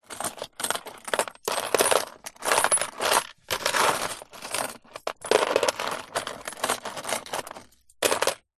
money coins in car centre console compartment movement handling rummage; foley; zapsplat